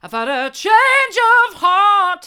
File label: i've had a change of heart hard, vocal